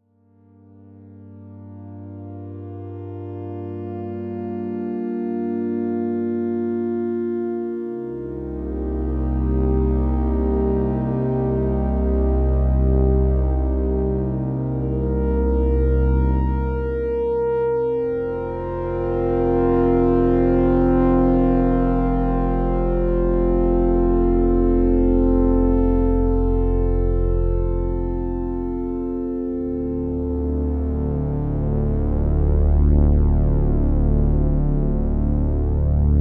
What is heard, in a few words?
ob-x pad, Oberheim OB-X